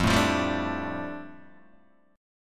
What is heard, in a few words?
F 6th Add 9th F down Guitar Open D, chord